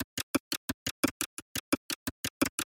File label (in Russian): nejlonovaya gitara perkussiya petlya 174bpm